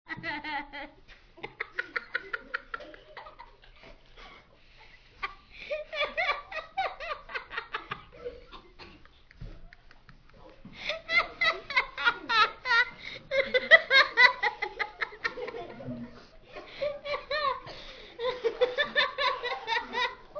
Laughing Baby

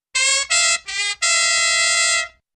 kazoo

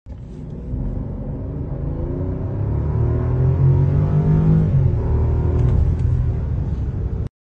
rolls royce

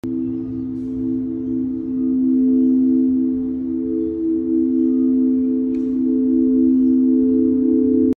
Crystal bowl; کاسه کریستالی

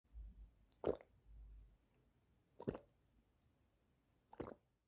drinking water

